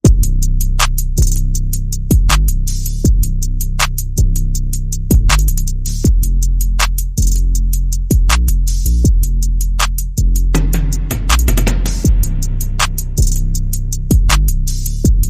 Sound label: Bass and Perc Only